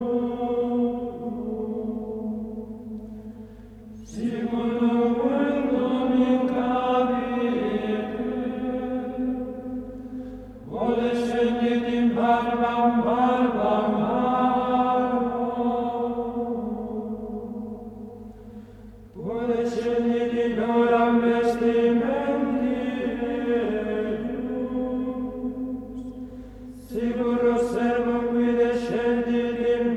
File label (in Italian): Canto Gregoriano